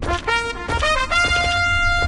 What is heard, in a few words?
scroll jingle